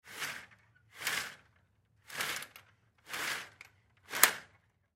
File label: Curtain Slide Close; Windows; Curtain Slide Close Slow; Household; Blastwave